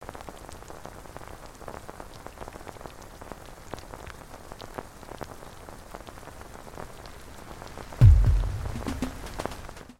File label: rain drummers